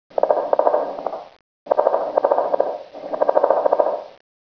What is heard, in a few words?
dead tree